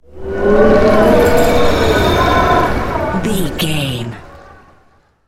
wind blizzard storm spell med

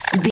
sci-fi hacking aliens

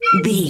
whistle slide straight tone short

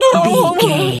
turkey ostrich hurt gobble

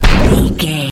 energy blast small

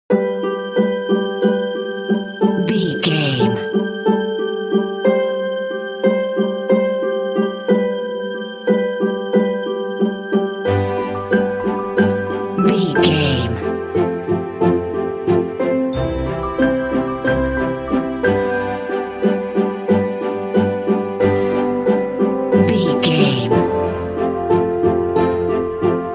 sentimental soft underscore

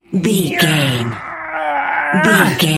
voice male effort push slow

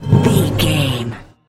manhole metal slide door open close

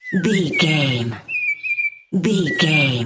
bird small songbird call chirp

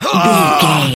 voice male death

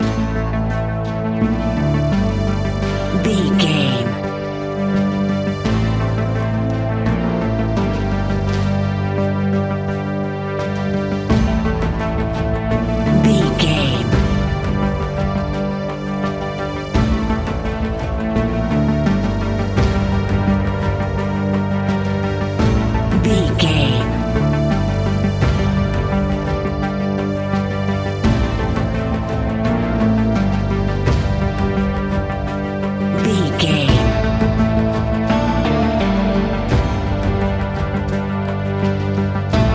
daunting rising tension; Daunting Rising Suspense 60 Sec; Catch 22 Music Network

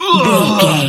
voice male death

